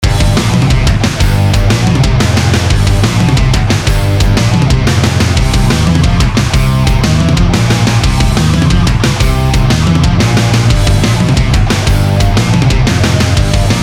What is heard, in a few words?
Rock 180